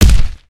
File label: Creature Footstep